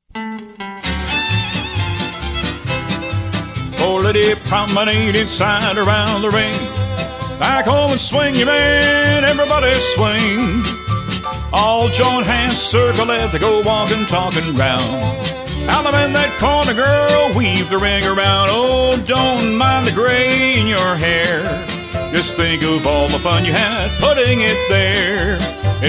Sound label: vocal sample